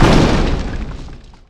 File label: Concrete Donkey Impact; Worms3D